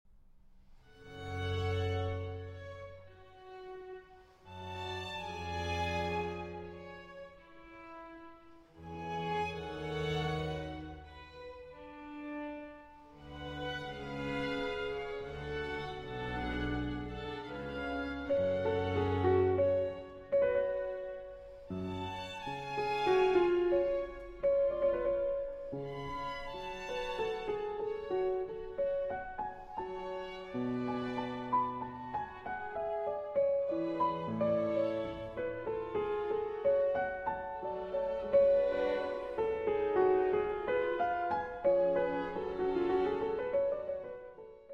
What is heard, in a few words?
Keyboard Concerto in A Major, Larghetto; BWV 1055 II.